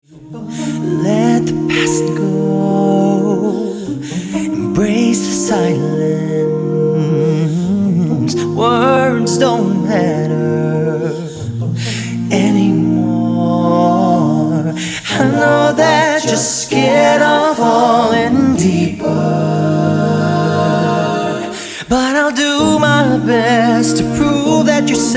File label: A Cappella